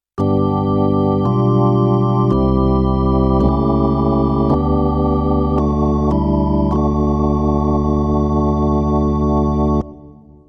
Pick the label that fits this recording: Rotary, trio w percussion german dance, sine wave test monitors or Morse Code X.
Rotary